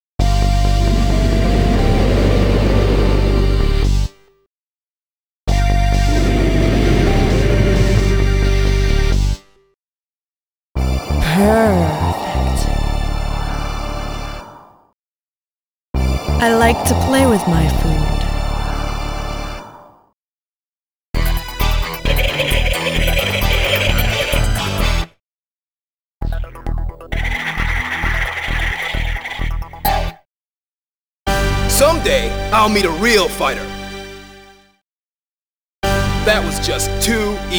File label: WIN & LOSS JINGLE COLLECTION; FX Fighter